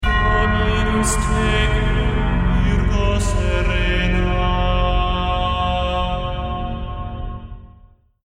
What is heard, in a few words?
lost round, music